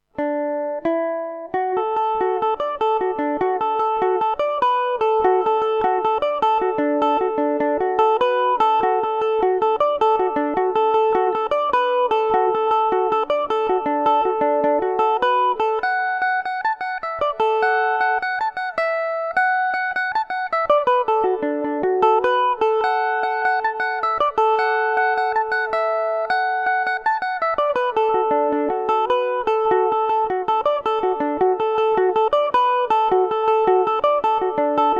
fishermans lilt dmaj, irish mandolin

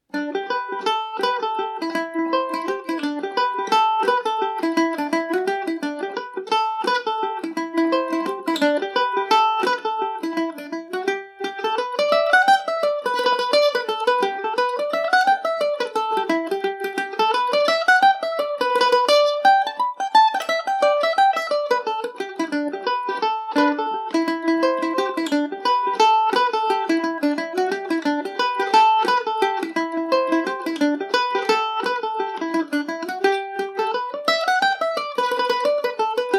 killavil fancy revisited g major, mandolin

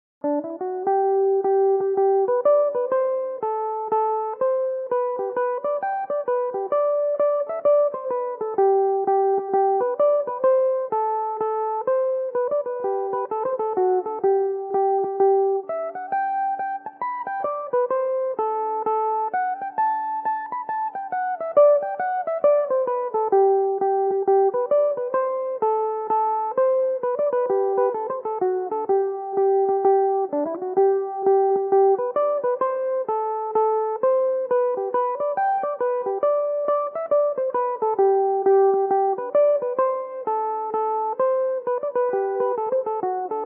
march g major, mandolin; The North Wind (march); Belmuse EM-190 electric mandolin

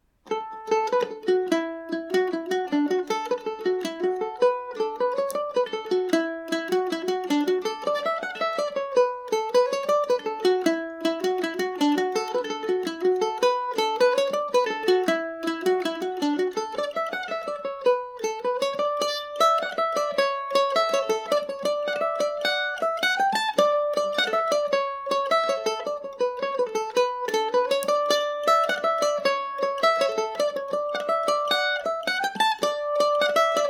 cordal jig, irish mandolin

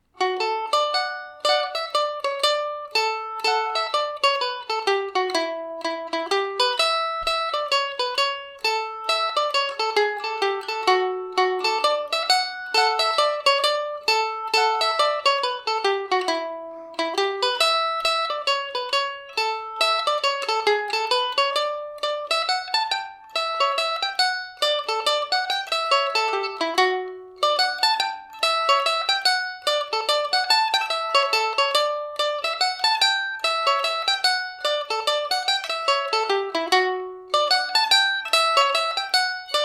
domra

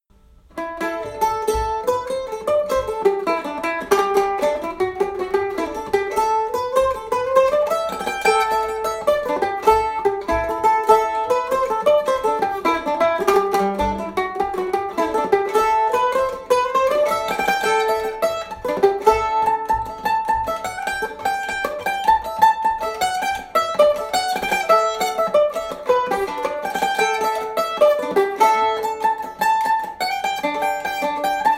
nightingale, mandolin; vintage Gibson A style mandolin; Michael plays this on his vintage Gibson A style mandolin

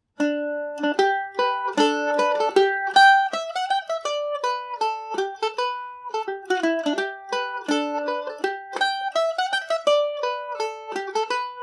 polka, Irish mandolin; saint marys

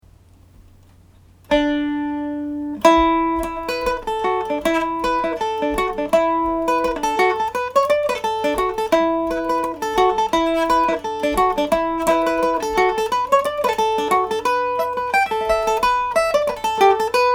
morning dew, Irish Mandolin

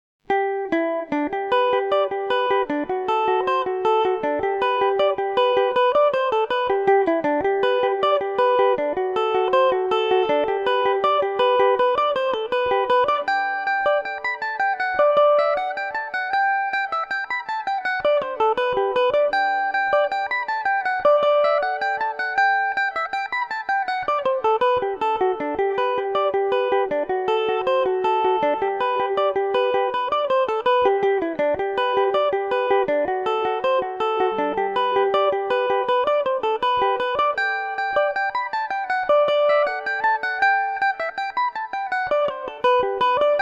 heather breeze gmaj, mandolin; Revelation Jaguar electric mandolin

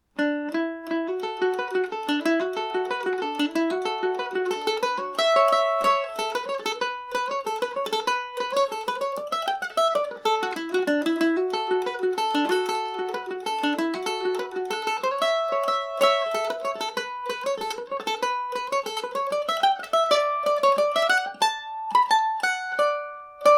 mandolin; Ragtime Annie

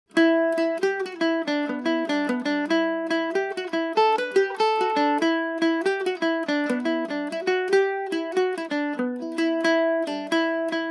jig, E dorian, mandolin; Pull the Knife and Stick it Again

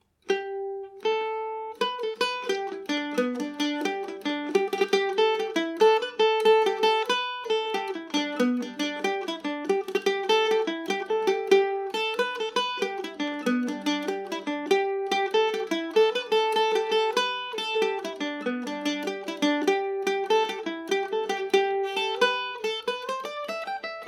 angry peeler gmaj, mandolin; The Angry Peeler; G&O 34 mandolin

